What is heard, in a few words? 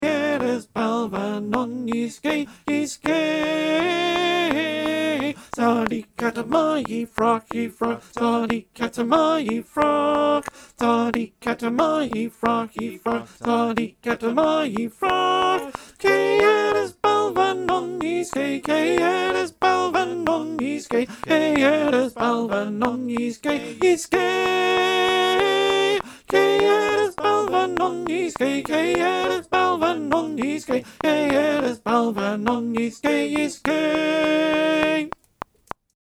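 Alto clicktrack, choir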